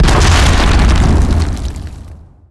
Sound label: explosion strong